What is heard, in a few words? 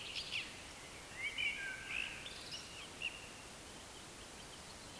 Turdus merula